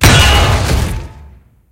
tank hit big unpierce; tanks; War Thunder

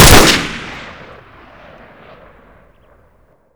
m14; Modern Warfare

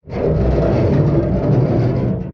damage vehicle; World Of Warships

